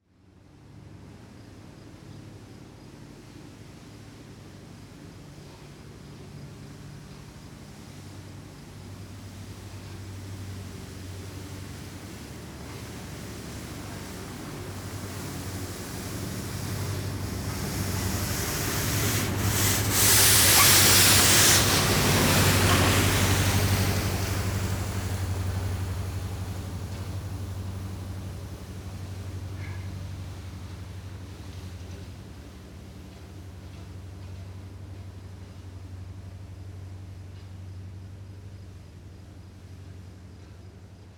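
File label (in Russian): Поливальная машина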